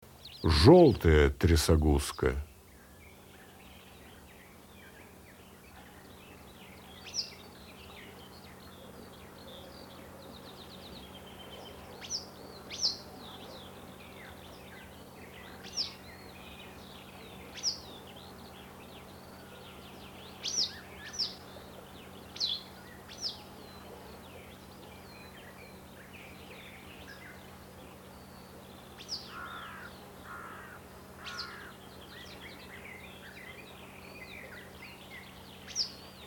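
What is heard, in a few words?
yellow wagtail voice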